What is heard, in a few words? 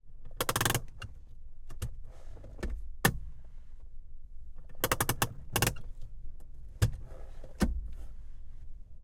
land rover defender interior handbrake; fvtt sonniss gdc audio